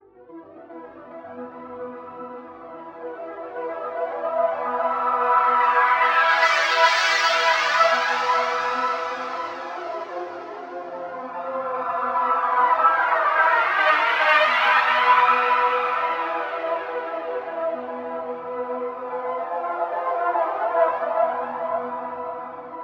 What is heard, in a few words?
Eerie Loop, Horror; Music; Horror Sound Pack